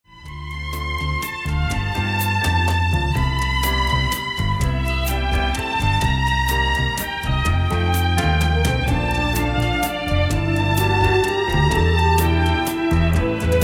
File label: Waltz